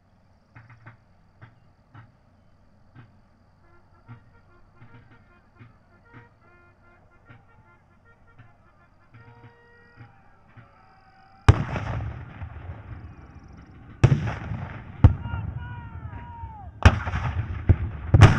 Civil War Battlefield, Period Backgrounds

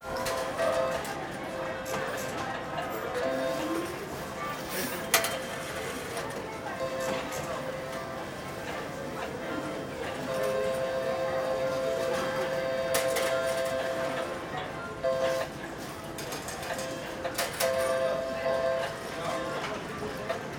Casino Interior

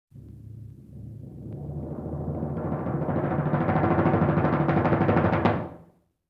LoFi Timpani Roll; Vintage Cartoon; Drums & Percussion

